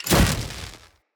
Charged Punch Whoosh; Weapons; Unarmed

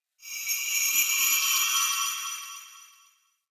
Fey Hub Magic Bells

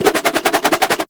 Nunchaku Quick Spin Whoosh; Warframe; Tenno

